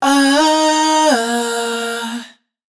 riff yeah Bbm wet, Vocal; Slate Digital Omnivox Vocal Sample Pack

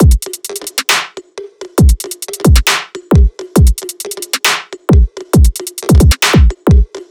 drums 135, Full Drum Loops; Slate; SEISMIC Drum Sample Pack

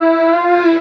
vocal where are you Am; SD AURORA Sample Pack; Slate

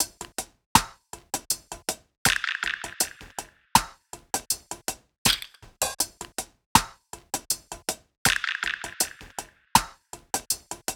perc 80; Drum Sample Pack; Loops; SD SEISMIC; Slate

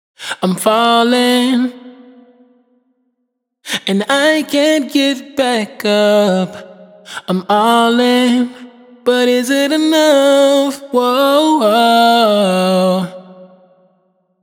hook Is it Enough 140 F# wet, Vocal; Slate Digital Omnivox Vocal Sample Pack

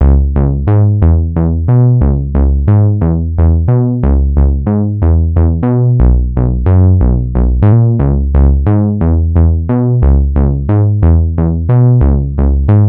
Synth loop 120 C#m, Synth Bass Loops; Slate Digital BASSHOLE Sample Pack

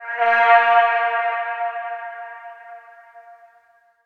vocal awake Fm; Slate; AURORA Sample Pack; Vocals

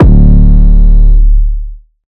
808 Bass Destroyer D3; Slate 808 Deep Dive; SD Signature 808s

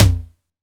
Tom Slap, 909 Toms; Slate; Vintage Drum Machines Volume 3 909 Edition

